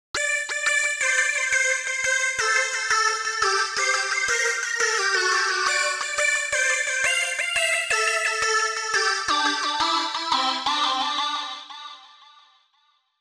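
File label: dnb lead D minor; lead arp chord melody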